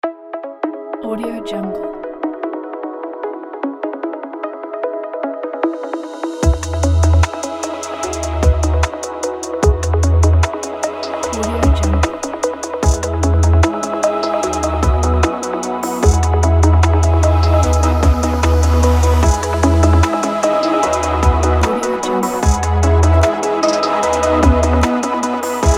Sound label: slow motion